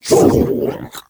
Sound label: WATER FROG